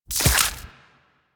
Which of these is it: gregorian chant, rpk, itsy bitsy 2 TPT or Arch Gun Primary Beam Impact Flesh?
Arch Gun Primary Beam Impact Flesh